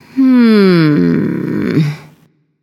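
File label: thinking, female; smite; voicepack; hera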